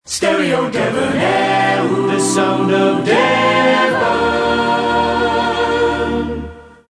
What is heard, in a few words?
stereo fast, idents